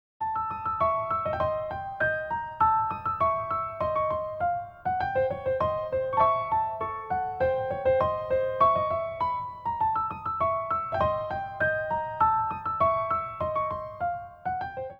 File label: Deep Sleep